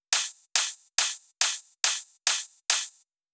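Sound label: drum Loop 140BPM overhead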